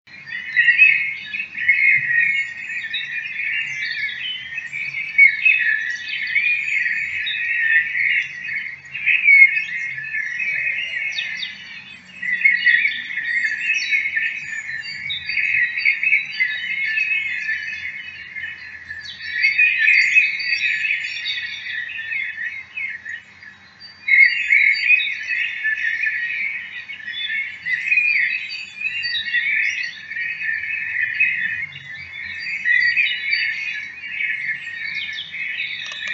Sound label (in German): Vogel